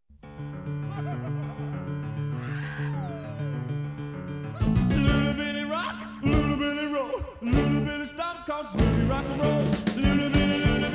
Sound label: Loonabilly Rock 'n' Roll